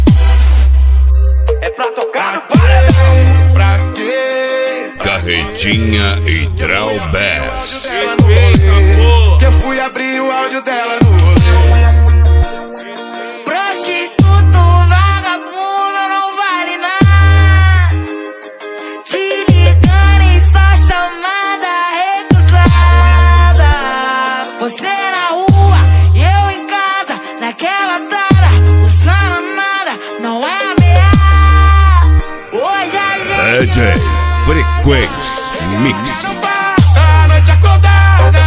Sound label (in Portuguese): piseiro